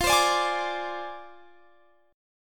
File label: F Minor 6th F down Guitar Standard; Fm6; strummed; JGuitar